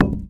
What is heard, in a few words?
rock impact soft, concrete; physics, concrete; Left 4 Dead 2; Left4dead 2 2013